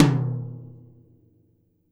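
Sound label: Jazz kit tom, Drums Acoustic; USB Soundscan vol.10; AKAI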